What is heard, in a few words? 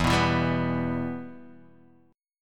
E-Minor, Guitar, DADGAD; 2,2,2,0,2,x